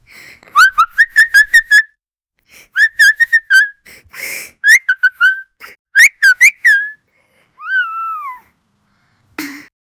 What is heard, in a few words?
girl whimpering, pain; from whip